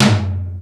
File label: DRY T, drum; Crypton; DrumBase5000